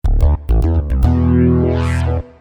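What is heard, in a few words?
Boom Square Bass, V-Synth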